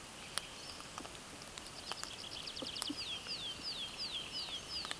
Anthus trivialis

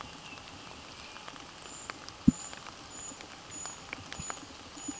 Certhia familiaris

